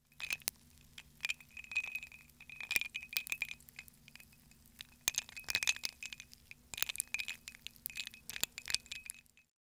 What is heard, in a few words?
Glass with Ice, Kitchen